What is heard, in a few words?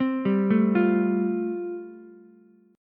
A Minor 7th Sharp 5th A, Ukulele; Am7#5 arpeggiated; 5,7,5,8